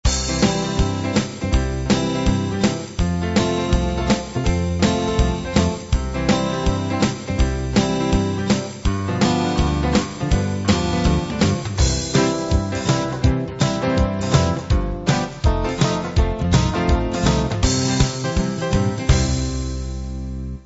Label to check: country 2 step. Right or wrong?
Right